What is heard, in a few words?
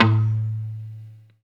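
guitar mute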